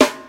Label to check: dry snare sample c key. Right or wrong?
Right